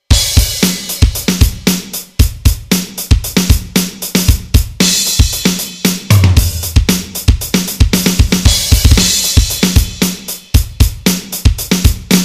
normal drum

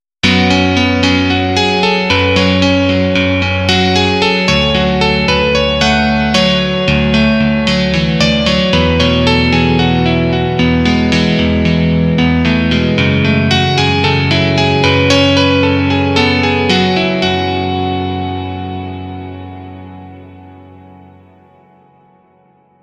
ele piano

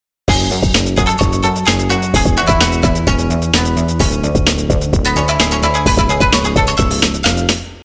shamisen

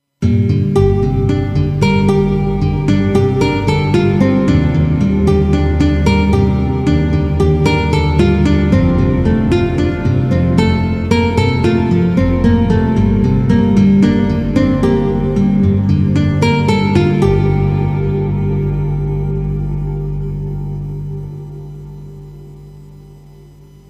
nylon guitar

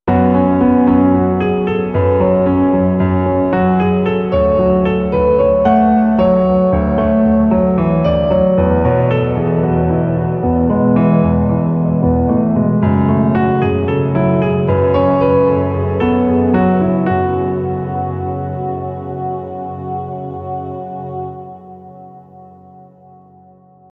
warm piano

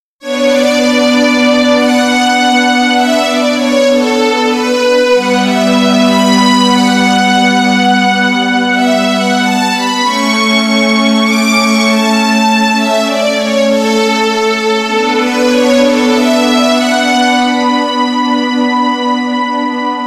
synth

